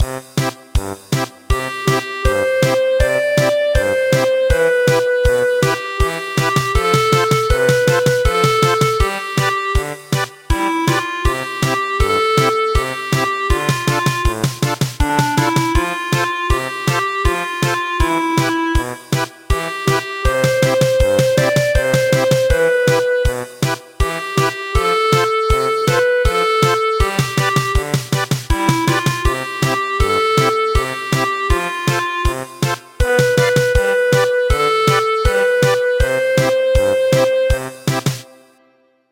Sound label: German Polka, midi; Serenade on the mountain